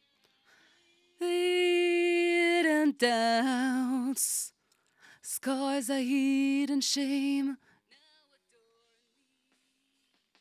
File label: Vocal